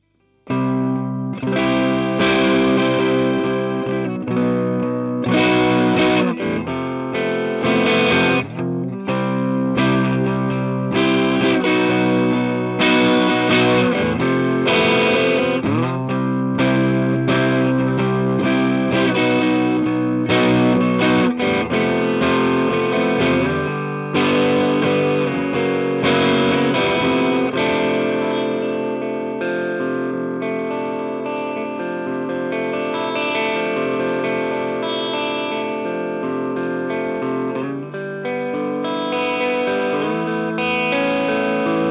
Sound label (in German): Ibanez Artcore verzerrt